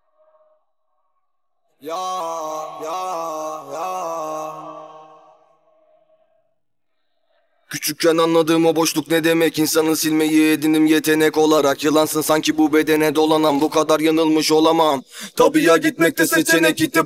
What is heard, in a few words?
vocals